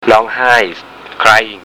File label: long hai crying, Thai